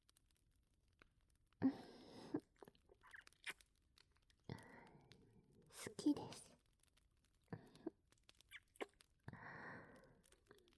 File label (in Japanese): 吐息たっぷり幸せ口づけ, ASMR